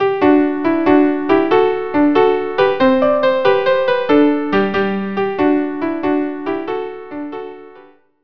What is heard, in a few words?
violin duo